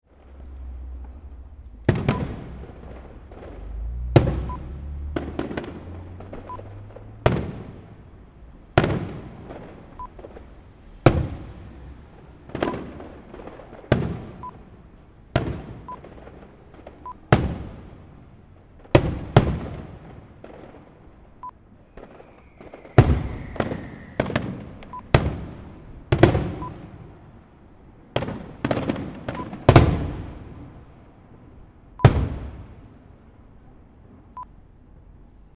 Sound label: Fireworks Display